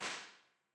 The Beautiful Room, Shure 55SH Series, impulse responses; Henry Olonga